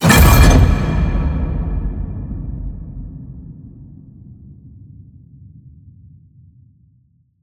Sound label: HIT Metal Crash, Cinematic Hits Designed